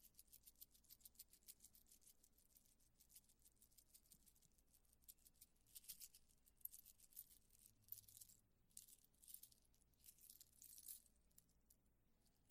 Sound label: seashells, ballroom